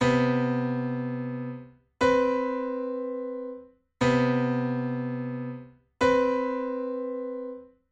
intervalo armonico septima mayor

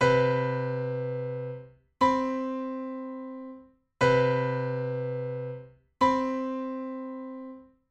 Intervalo armonico catorcena mayor

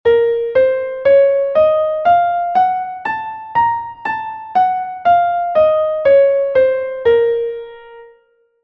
si bemol menor armonica

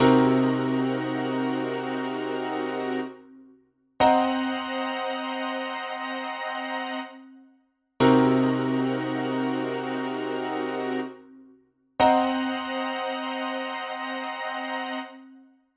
acorde septima semidisminuida

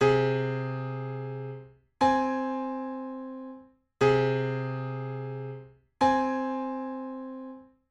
Intervalo armonico trecena menor

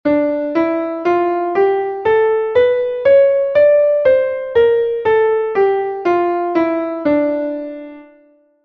re menor melodica

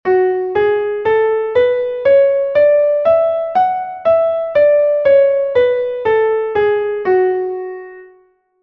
fa sostenido menor natural

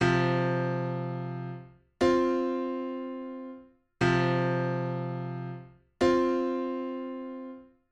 Intervalo armonico cuarta justa

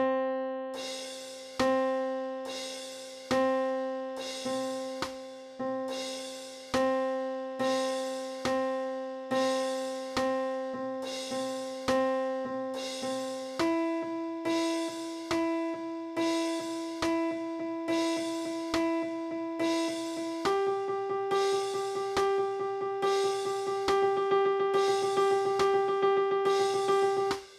trecillo

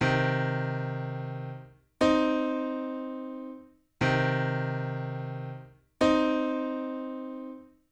Intervalo armonico tercera menor

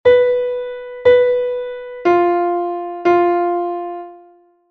enarmonia

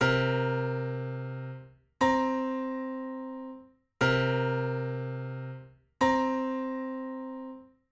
Intervalo armonico catorcena menor

